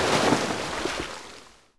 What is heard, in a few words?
Ocean End; Civ4 warlords